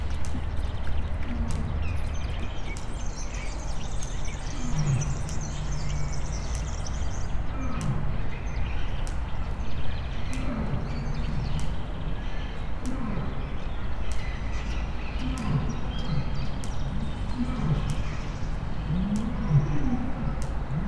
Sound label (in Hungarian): oroszlán, zöldküllő, pacsirta, szamár, mezei pacsirta; debreceni zoo 2012